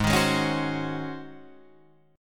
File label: Ab 11th Ab Guitar Drop D; strummed; JGuitar